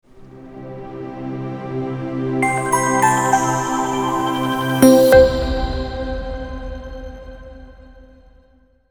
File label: Corporate Logo